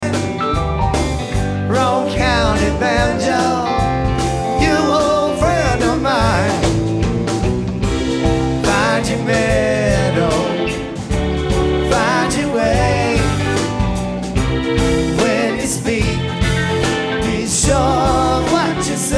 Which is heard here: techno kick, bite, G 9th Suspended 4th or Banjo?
Banjo